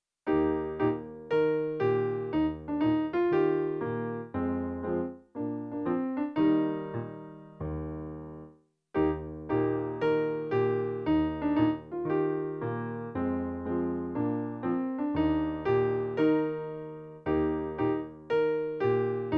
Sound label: Backing